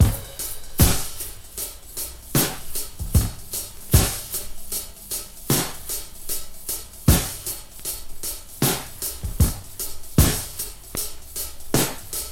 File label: 77 bpm breakbeat sample A key